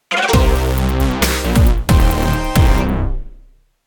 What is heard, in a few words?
Win; boomerang fu